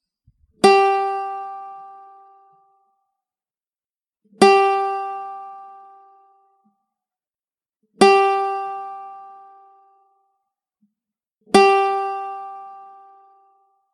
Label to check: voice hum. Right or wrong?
Wrong